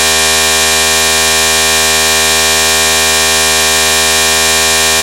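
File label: synthesizer single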